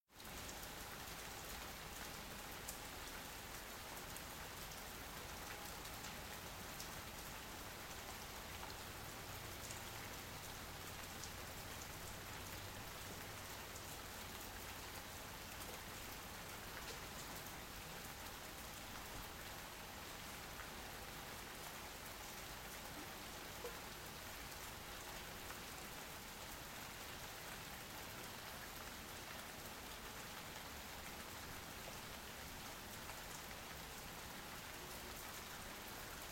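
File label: pleasant rain sounds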